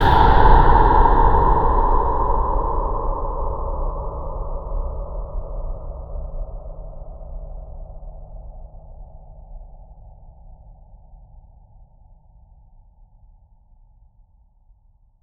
Cinematic Metal Impacts; the vessel strange

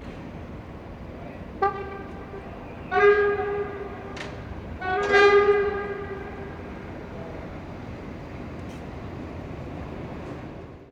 train horn; best service Blue Box

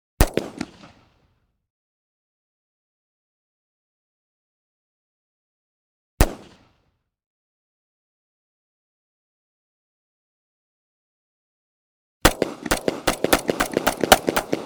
.45 ACP Blast Medium, Rapid Fir; Hollywood Edge; Heavily Armed

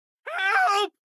town human road; Blind Drive

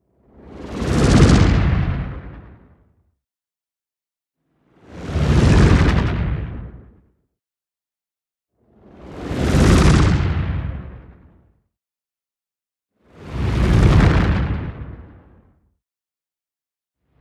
WHOOSH LARGE Orbital Trail; boom library; Matter

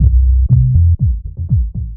Music Distant Car Deep Loop; SFX Kit; Foley - Misc

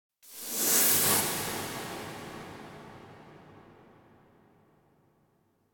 Cymbal Strike Brushes High Tone Whistle Impact; Hollywood Edge; sound designer's toolkit v2

